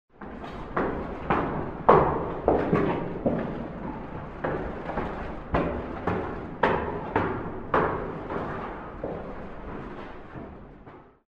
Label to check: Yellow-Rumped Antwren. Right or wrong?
Wrong